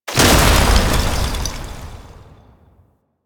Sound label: Alchemy Grenade Explosion Cold; Warframe; Entrati